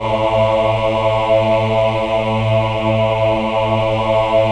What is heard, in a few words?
VIB A2, VIBRATO, Voices; Spectrasonics